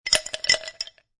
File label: Ice Cubes Drop Two Ice Cubes Into A Glass; Drink Mixing